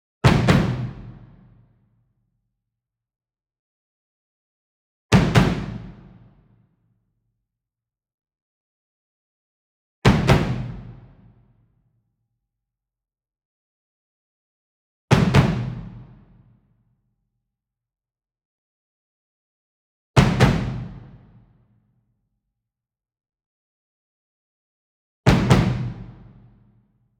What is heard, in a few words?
ENSEMBLE DRUM Bass Drum Marching Double Medium; boom library; Cinematic Strikes Construction Kit